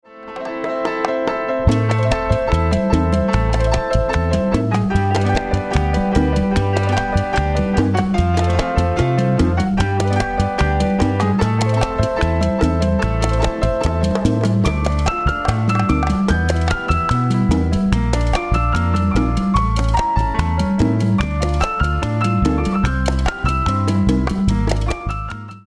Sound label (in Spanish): Flauta Andina